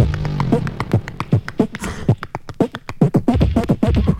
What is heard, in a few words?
snakebite, Beatbox, Drum loops